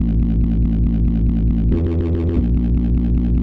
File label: TECHNO BASS 140 A